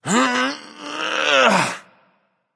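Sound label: grunt lift, male; smite; voicepack; rama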